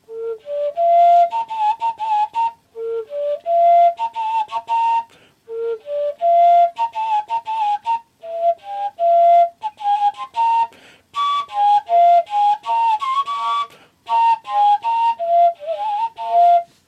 overtone flute

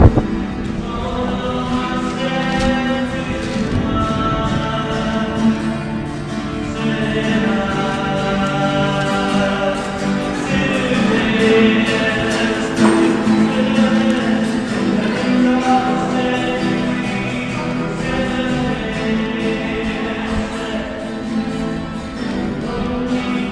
busker in a tunnel

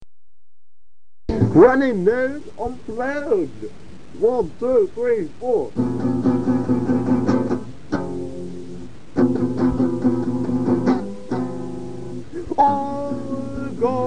acoustic runny nose

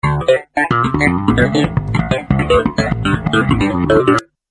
bass

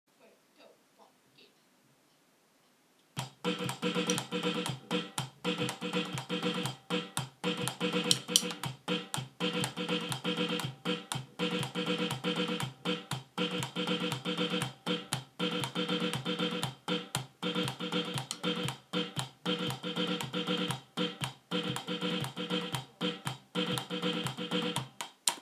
drumming performance

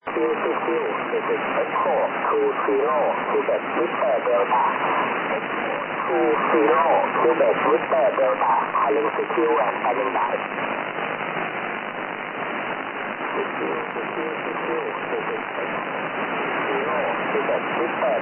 50.115 MHz SSB

